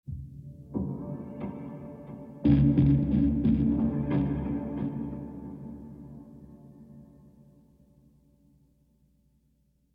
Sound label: Lap Steel Rhythmic; The Last of Us; ML Lap Steel Rhythmic Mood